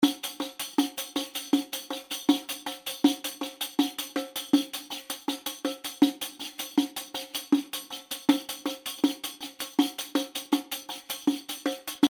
riqq; afrique; nordouest; arab

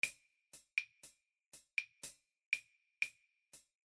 clave rumba

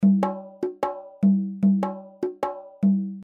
pattern bolywood; Latcho drom

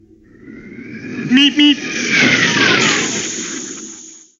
bip

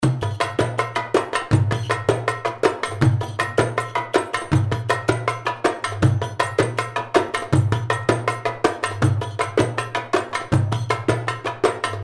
baio; afrique; nordouest; arab

